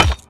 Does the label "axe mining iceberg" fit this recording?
Yes